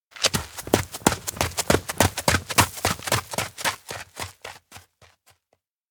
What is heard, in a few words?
steps outdoor mountain road run; anemoi